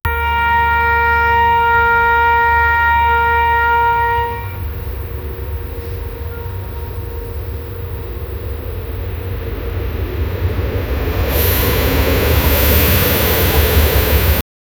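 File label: industrial fog and a train; american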